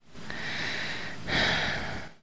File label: sigh